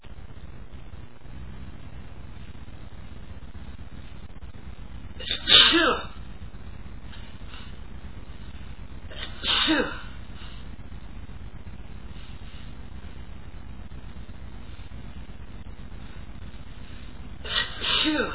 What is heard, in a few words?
pepper, male, achoo